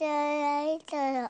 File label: Hurt; MilkChoco